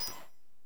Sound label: ui select; Half Life Alyx